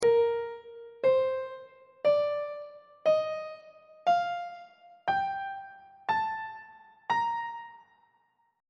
b flat major scale on treble clef

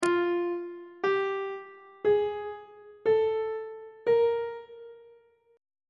e sharp 3rd intervals on treble clef

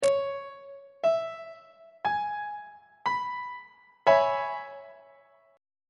e major 6th 3rd inversion on treble clef

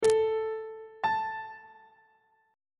c triple flat note on treble clef

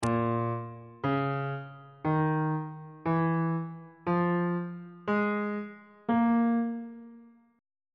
a sharp blues scale

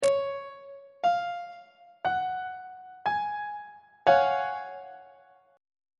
g flat major 7th suspended 2nd 2nd inversion; on treble clef

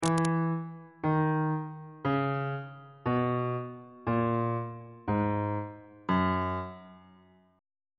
f sharp mixolydian mode descending on bass clef

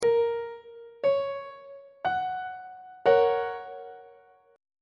g flat major 1st inversion on treble clef

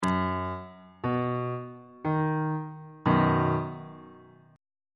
b major 2nd inversion on bass clef

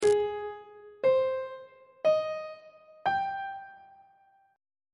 a sharp dorian 7th chord 7 is g sharp major 7th; on treble clef

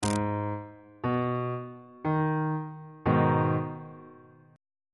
g sharp minor chord on bass clef

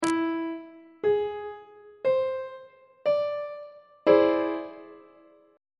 f flat augmented 7th chord; on treble clef

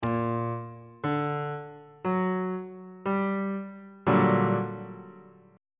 g minor major 7th 1st inversion on bass clef

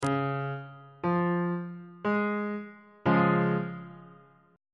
c sharp major chord on bass clef

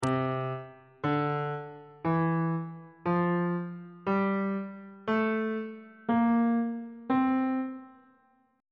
c mixolydian mode note positions on bass clef

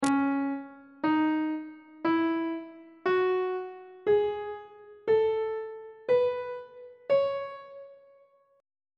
c sharp aeolian mode on treble clef

